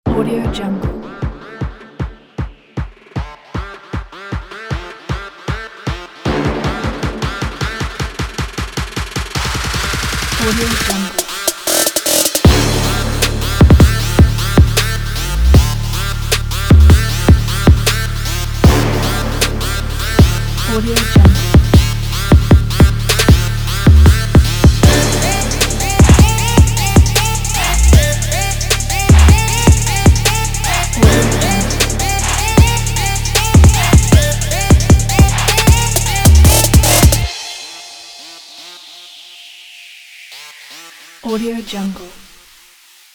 trap

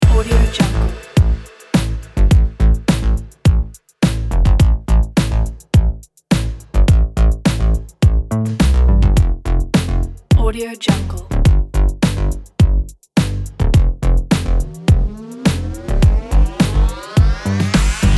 80s retro beat

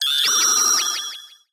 laser beam stun

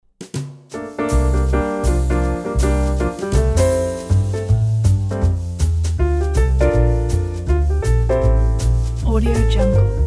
luxury lounge jazz trio pack

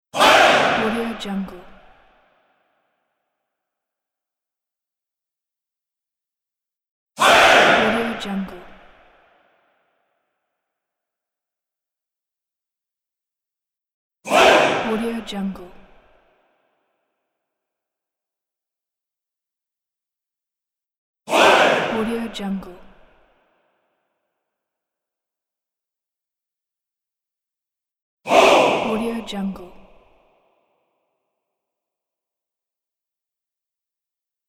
men crowd war shouts

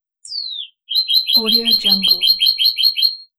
bird chirping

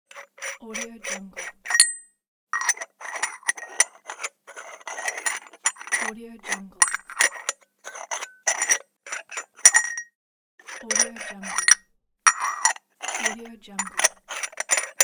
untwisting the metal part

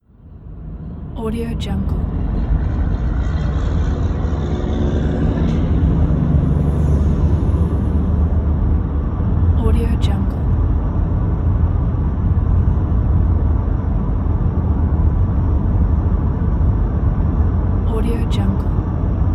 driving coming to a turn signal; eternal-future-productions

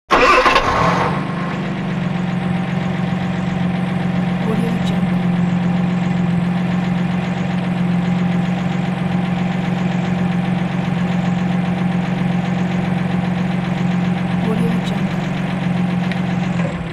ford zodiac starts up and passes by; hollywoodedge

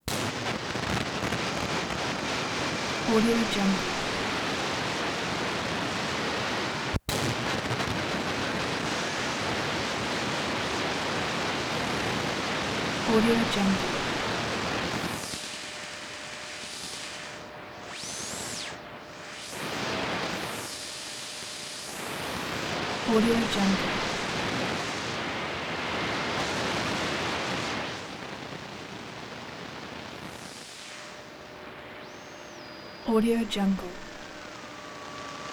video static interference shortwave radio

